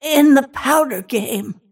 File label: move pain; snapfire